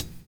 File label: N.Y FILL; Univers Sons